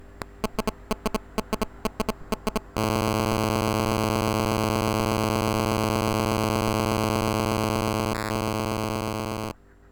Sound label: cell phone emi